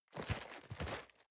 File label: cardboard box medium turn; objekte